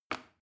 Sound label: plastic button small release heavy